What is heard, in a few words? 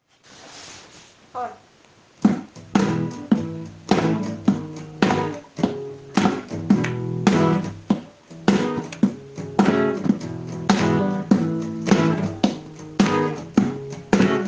jazzy